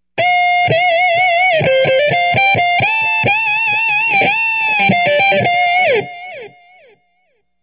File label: guit